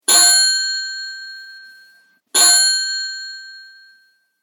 Bell Bicycle 2 Rings; Vehicles; Bicycles

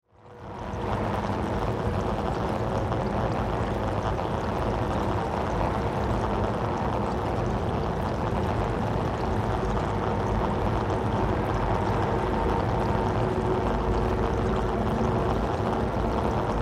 Horror Laboratory Electrical Arcs Water Boil; Scary Soundscape

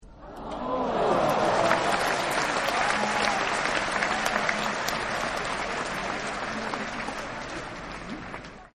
Applause Medium; Sound Effects:Human; Partners In Rhyme

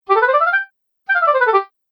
Fun Game Chromatic Up And Down; video game

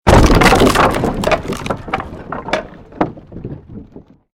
created wooden structure crash medium; Series of dramatic events based on wooden smashes and collapses; Crash Sound Effects

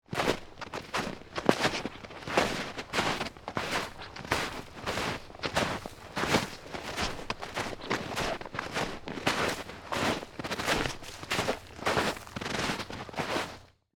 Footsteps Snow, Foley, Footsteps, Snow, Walk; Human; Blast Wave

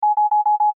Science Fiction Computer Readout Bell Chime; Computers; SFX Bible

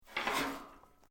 METAL STRAND MOVE; Metal (Sound Effects:Materials)

